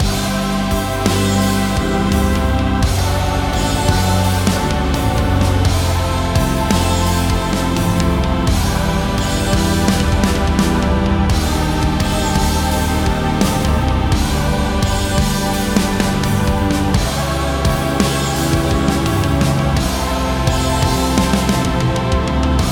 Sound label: BGM Kowloon Street